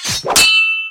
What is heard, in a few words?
Soldiers Fighting